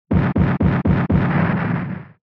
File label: Shooter Damage; SNES